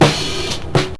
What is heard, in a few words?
SNR LOOP; SP1200; E-MU Producer Series Vol. 8 – Platinum Phatt; PlatinumPhattCD1